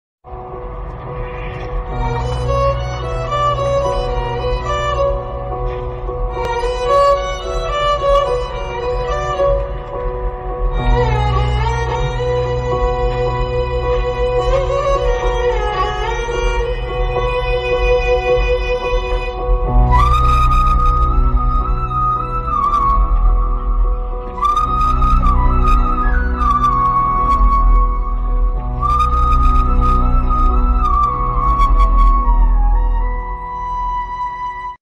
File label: sad violin bgm ringtone; sita ramam; dulquer salman